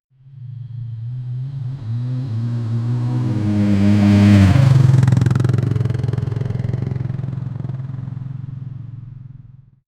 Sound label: a small bump collision, motorbikes